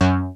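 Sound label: LIVE BASS, MPC-60 DRUM; Greytsounds VOL-1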